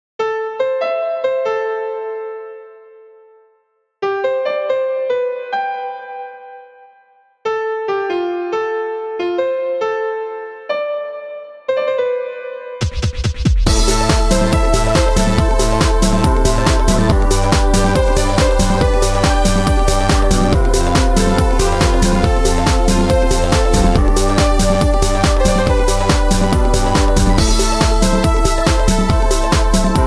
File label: piano beat